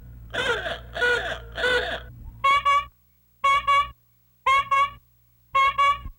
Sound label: Honk